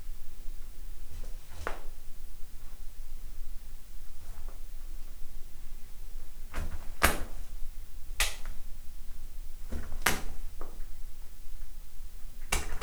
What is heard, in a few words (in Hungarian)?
tigrispiton csontropogás köröm követ karmolász; állathangok; miskolci zoo 2009